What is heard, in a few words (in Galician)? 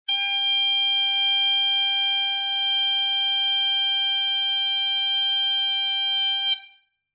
chillon